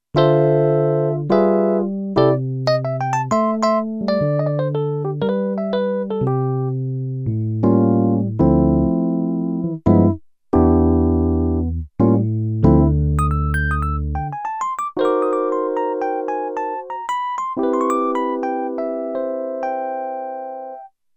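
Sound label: Electric Piano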